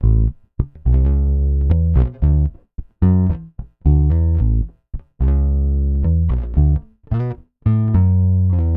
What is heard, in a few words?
Pop, Bass